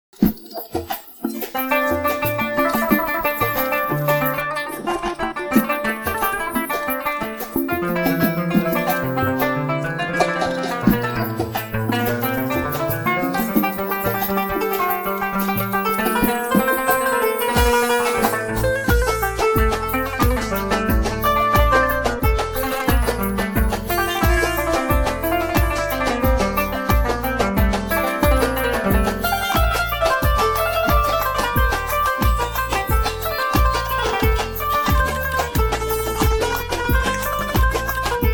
Tunisi Mix, quanun; Best Service; Authentic Oriental Plucked String Instrument

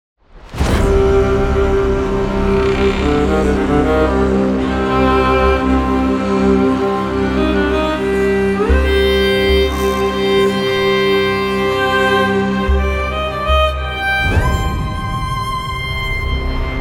Violas, virtual viola; Best Service; Emotional Viola

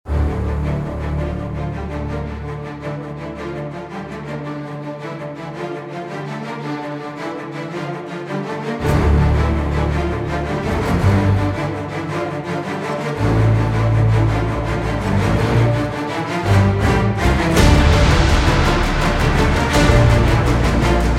Trailer Strings; Musical Sampling

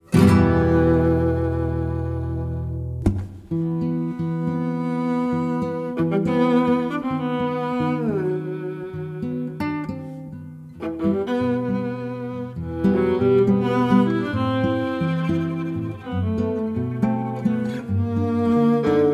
Viola d Amoure; Best Service; Emotional Viola; virtual viola

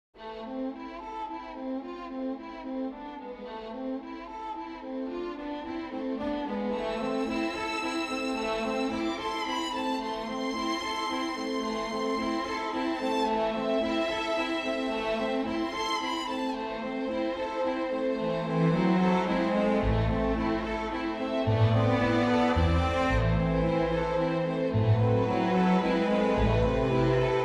Ballad for Strings

